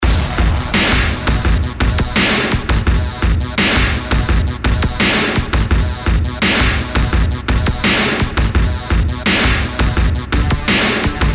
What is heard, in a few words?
store music; battle bears 1